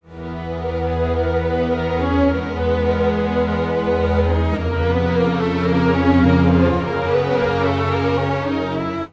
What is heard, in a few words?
strings, pitchshift; izotope radius